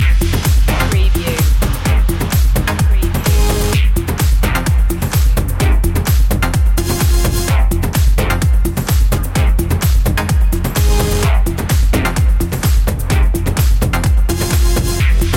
tribal drops

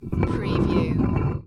Metal Drag Metal Grind Short; Industrial / Machinery

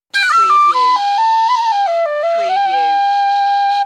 Tin Flt Riff

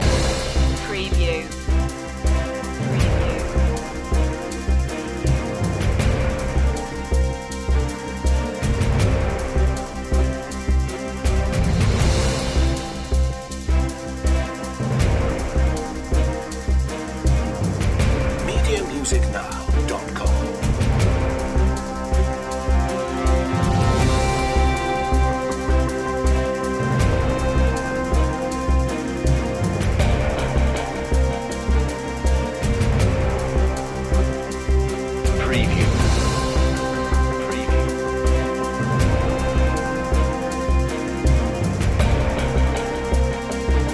informative news loop

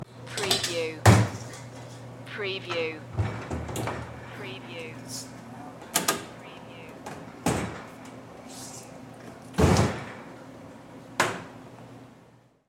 Kitchen Noises; General sound effects heard in bars and restaurants from glasses clinking, mixing drinks

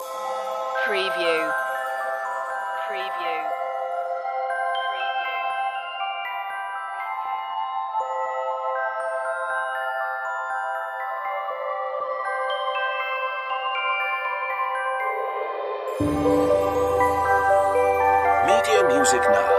fairy dream magic loop

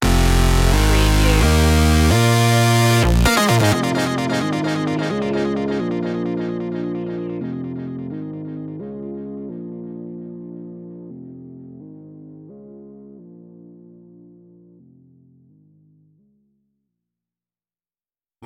sandy shoes stinger

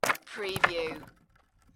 Wood Junk Crash

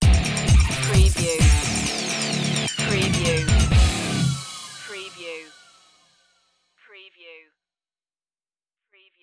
80s revenge stinger

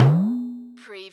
CARTOON BOUNCE

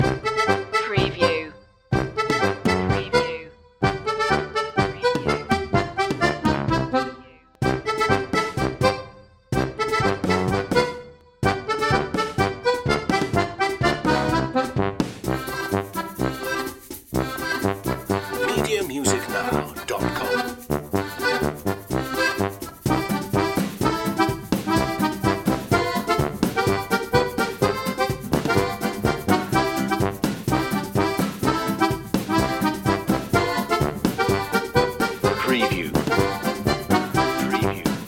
cajun gumbo loop

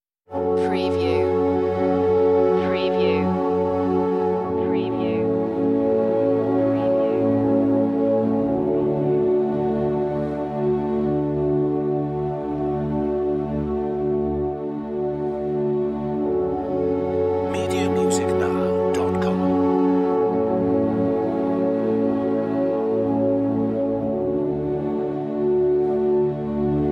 strings only

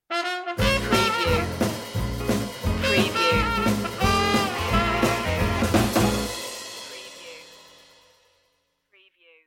blues stinger

